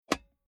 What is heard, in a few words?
Car Classic Bentley Eight Metal Ashtray Closing Shut Single; Transport; Car Sound Effects